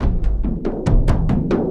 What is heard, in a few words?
Funka Doonka Chika Doonka, Drums, Frame, Kendang, Short; Sonic Foundry (Sony Creative Software); Friendly Fire